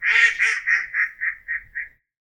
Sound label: mallard, animals